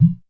WATER DR, percussion; drum

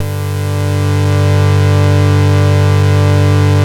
QUINTEN G2, SYNTHS; SE-1

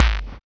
NOISE BD, bass drum; BASSDRUMS

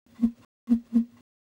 ETHNO 125, FX

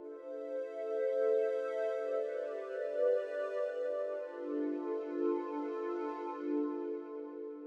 SYNTH PAD ETHNO 125 A, Sphere

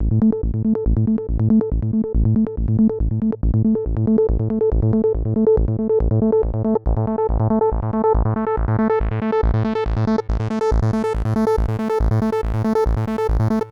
SNTH BASS TEKNO 140 A

